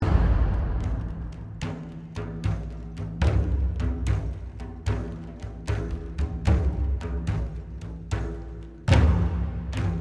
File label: Castle Combat; Ferelden; Intensity